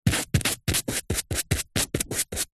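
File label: office paint brush artists dabs brushes on wood palette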